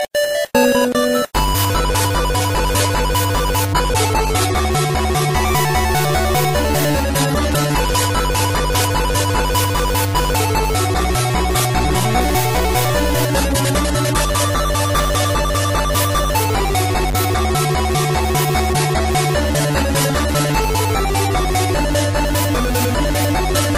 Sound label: Electro